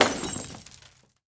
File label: glass break